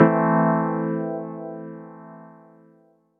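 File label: Am chord; Bodega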